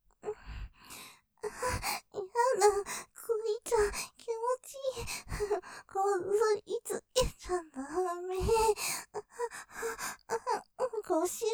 喘ぎ やだぁ、お兄ちゃん気持ちいい、こすりつけちゃぁだめぇ, ASMR; ステレオ; ループで楽しむ！シェフの気まぐれ抜き抜きコース

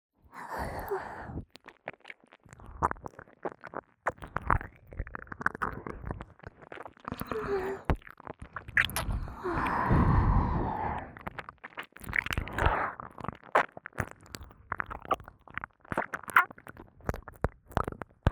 (右)耳舐め 耳を塞ぐ; 耳の奥底で蠢いて～舐め音に溺れるバイノーラル～; 舐め音特化

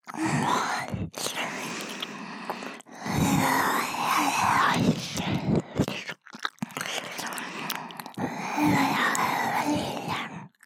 はげしくぺろぺろ 台詞なし, 右耳; カスタマイズトラック; フリー耳舐め（右耳パーツ）

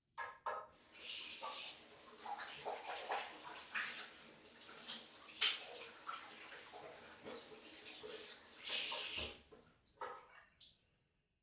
手を洗う

